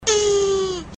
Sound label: turtle mating